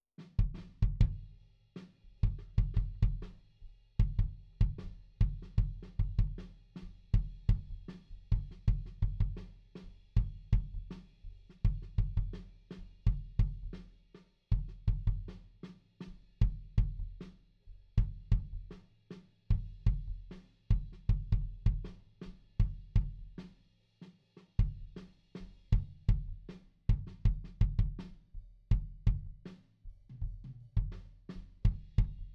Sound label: Kick IN